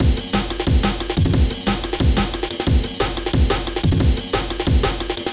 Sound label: 180 Fat Layer Amen, Breakbeats